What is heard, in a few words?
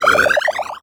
Multimedia Internet CD-Rom Flash Animation Cartoon